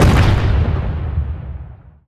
Railgun

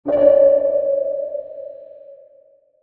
Sonar Ping

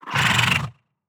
Crawler Idle

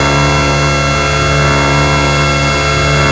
Clown Exosuit Horn

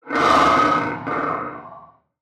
death; FRACTALGUARDIAN1

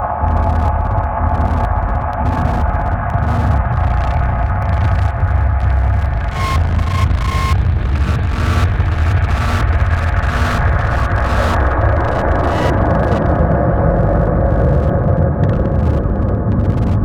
WATCHER idle Loop

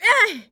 Female damage

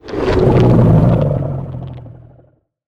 MANTIS death

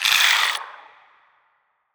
damage; SPINELING

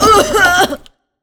HUMAN choke Female

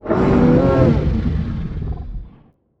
ENDWORM attack

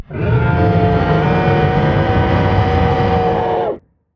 death; CHARYBDIS

